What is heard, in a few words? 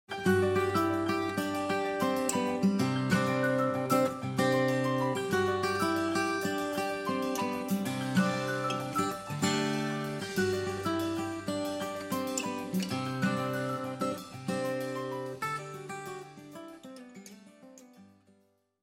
bgm